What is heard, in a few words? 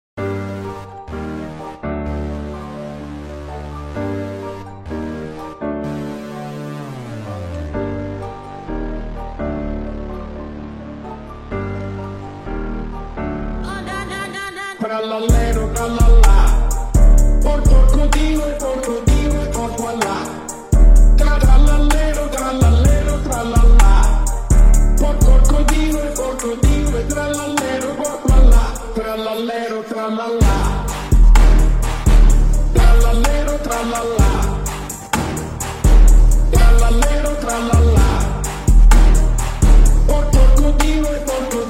8d Audio Trap